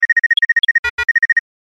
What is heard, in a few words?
Notify - Email